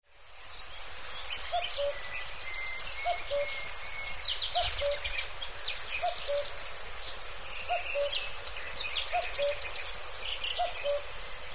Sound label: cuckoo; emporda